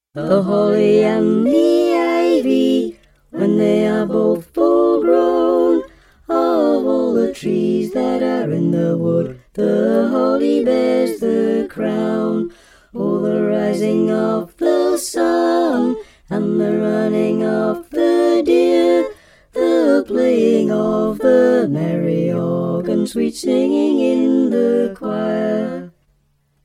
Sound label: The Holly And The Ivy Alto, Choir; Choir Harmonix; Harmonies; Carols